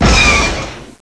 shoot

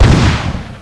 m2 shotgun firing

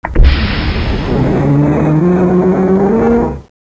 mantaship undock

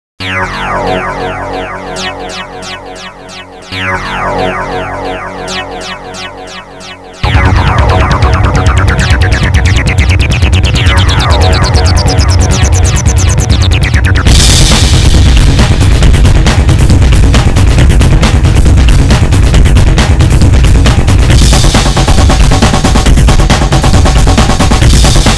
music; musiques

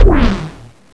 cloak break

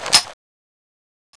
ak47 mag tap

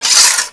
draw, weapons

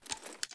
mp5 mag

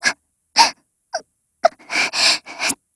m14 bar chew

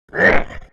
attack; scmod; humanerror; agrunt

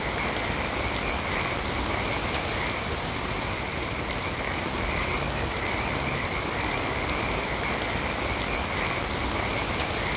jungle wet

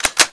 ak47 slide

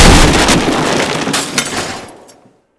drop on box

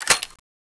m3 cock, weapons

